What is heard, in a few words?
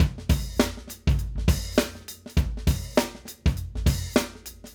Acoustic Live Drum; Platinum Audiolab